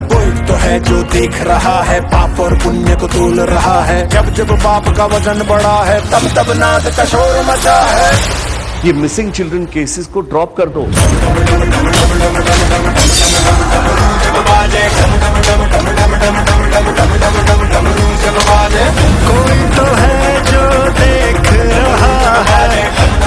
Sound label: Ringtone; sector 36